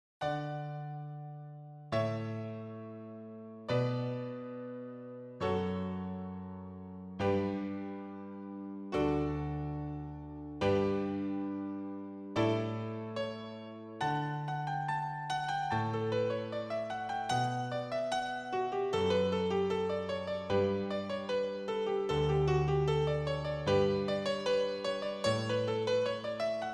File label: Canon in D - Wedding March; Pachelbel